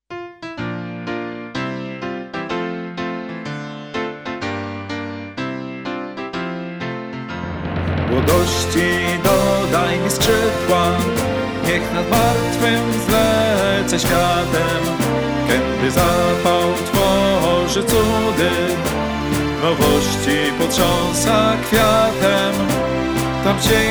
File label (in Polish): Hymn szkoly; Oda do mlodosci